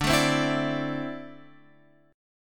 D 7th Suspended 2nd down Guitar Open F; D7sus2 strummed

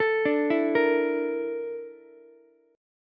Bb Major 7th Flat 5th Bb Ukulele; BbM7b5 arpeggiated; JGuitar

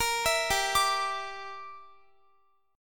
G Minor 6th, Guitar; Gm6; arpeggiated; x,x,20,21,19,21

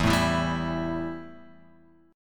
E Minor Double Flat 5th E; Embb5 Chord {0 0 2 0 X 0} strummed

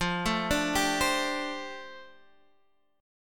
F 6th Add 9th, Guitar Open D

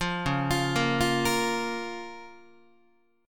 C sharp 6th C sharp Guitar; arpeggiated; C#6; JGuitar

